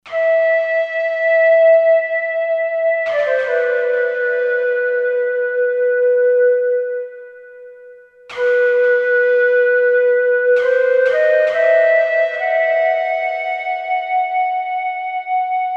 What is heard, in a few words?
Wind Flute, Korg MSC-16S; Korg MSC-16S Environment